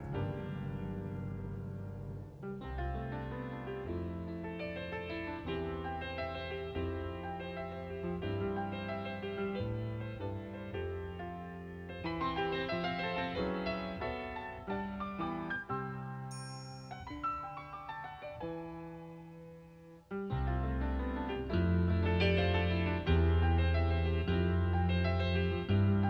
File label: Fugue